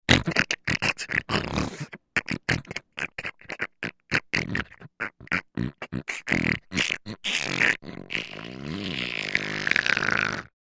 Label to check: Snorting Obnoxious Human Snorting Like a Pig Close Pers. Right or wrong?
Right